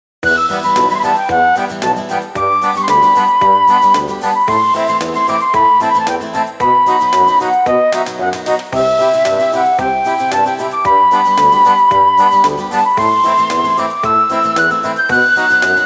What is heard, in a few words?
fairy; メルヘン 開放的 妖精楽隊; 街のお祭りにどこからともなく現れ盛り上げてくれる妖精楽隊。